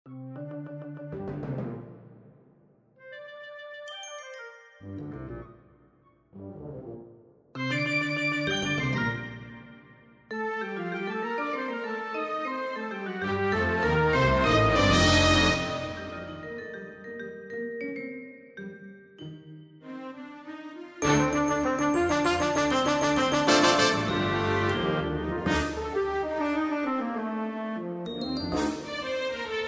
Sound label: Canon for Orchestra; symphony no 5